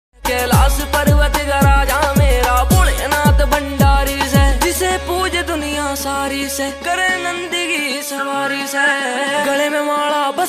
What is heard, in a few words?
pop ringtone; mahashivratri; bholenath song